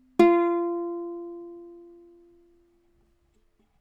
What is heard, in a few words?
uke